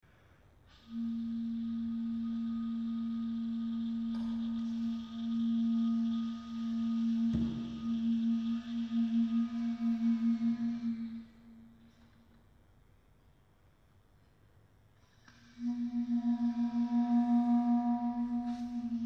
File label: Clarinet 4tet